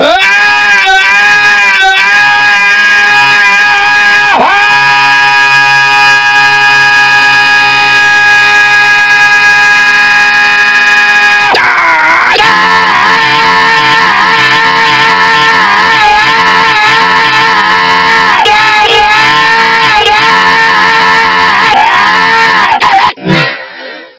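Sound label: scientist loud scream; ragemap2019; giegue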